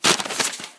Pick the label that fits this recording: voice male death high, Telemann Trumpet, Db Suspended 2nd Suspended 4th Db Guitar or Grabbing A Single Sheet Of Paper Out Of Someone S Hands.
Grabbing A Single Sheet Of Paper Out Of Someone S Hands